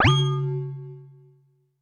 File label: U220 FX Set, Drum Machines; Roland U220